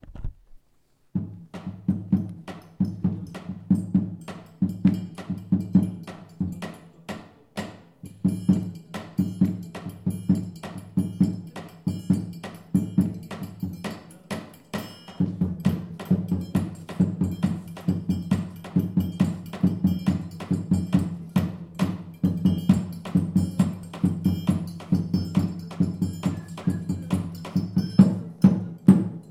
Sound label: Calvinhada; Albert Cazard